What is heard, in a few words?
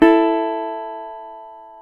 GTR, Dobros, National; Club-50 - Foundations Roland